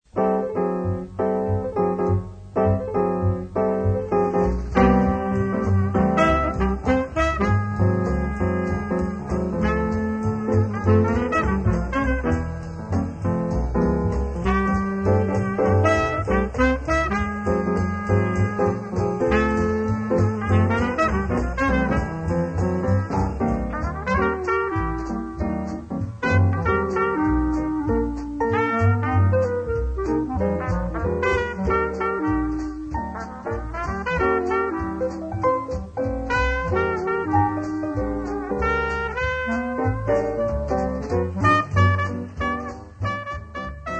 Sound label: Jazz